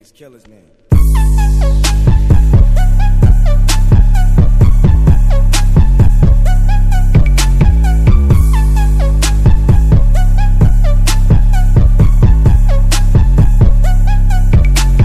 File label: Bass Boost Ringtone